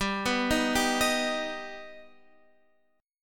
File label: G Augmented G Guitar Half Step Down